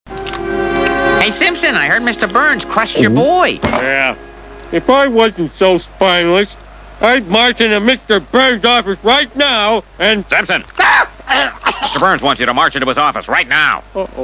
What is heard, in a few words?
car